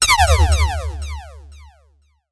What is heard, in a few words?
science fiction laser gun or beam fire